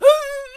Harvester Attack Vocals

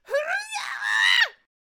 Peasant Attack Vocals

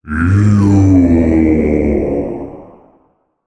Reaper Attack Vocals

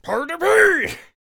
King Attack Vocals

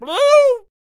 Potionseller Attack Vocals

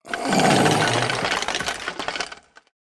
Skeleton Warrior Death Vocals

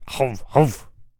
Protector Attack Vocals

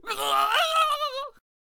Death Vocals; Jester

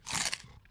Raptor Bite Sounds

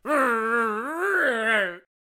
Bank Robbers Attack Vocals

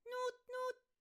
Fan Bearer Attack Vocals

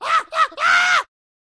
Attack Vocals; Barrel Roller

